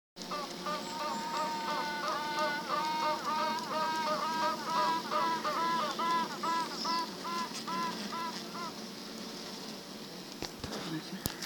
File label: piping; queens